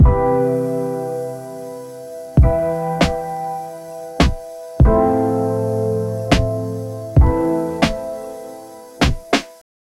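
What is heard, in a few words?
lo-fi vlog music with soft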